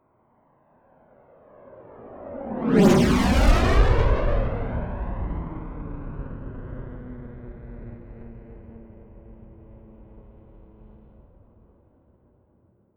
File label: military jet fa-18 hornet turbine flyby left to right fast close